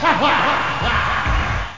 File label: tilt; MiniArcanoid